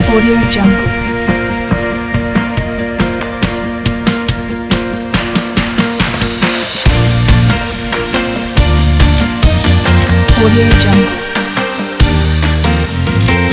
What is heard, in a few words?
infomercial kit